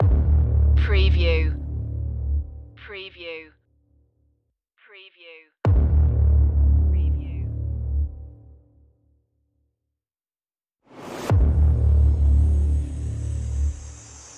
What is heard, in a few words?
bass drums sound fx only